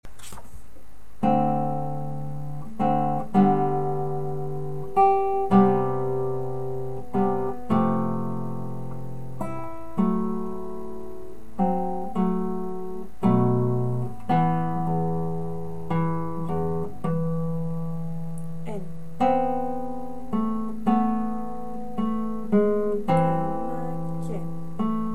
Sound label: tango